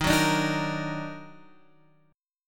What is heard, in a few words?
Eb Minor Major 7th Eb, chord